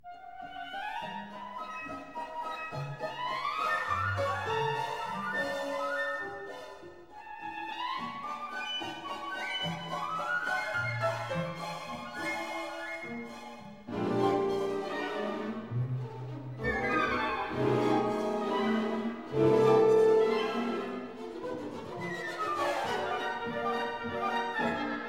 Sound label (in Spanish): Música; Variación del pájaro de fuego